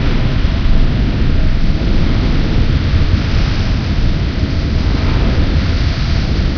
hangar, ambient; hl2; borealis

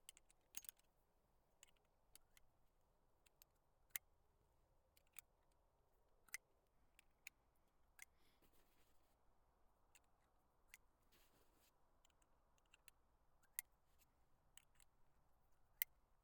Colt Python 357 Magnum Loading Gun; Pole Position

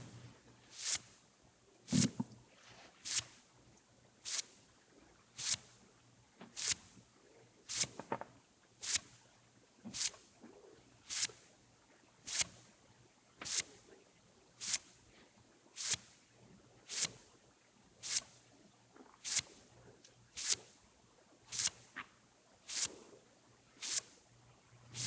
Smartphone Swipe Short; Joshua Reinhardt; The Ultimate Phone Bundle

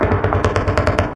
city battle; hl2

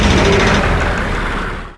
spindown, spin trap; hl2

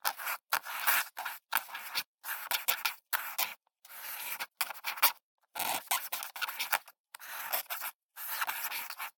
Handwriting Quill; SoundBits; Handwriting Sound Effects

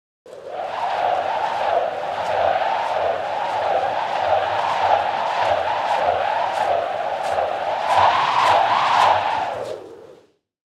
Swirl Whoosh; Hits; Mechanical Wave

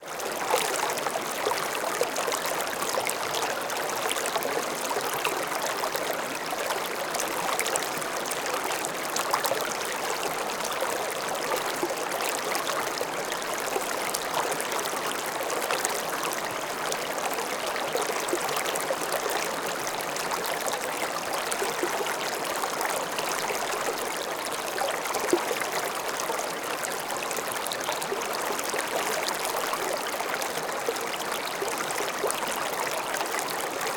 wilderness flowing water stream; Bluezone

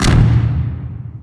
combat, ambient combat far; hl2

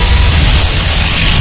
attack charge, vort; hl2

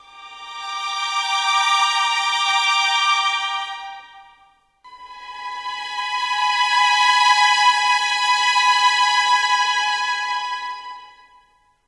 sus loop, stinger; hl2

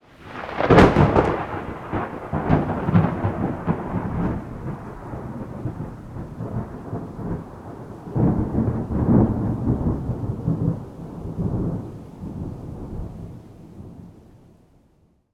thunder strike; Soundrangers

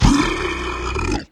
Zombie Groan Pitched Down; Glitchedtones

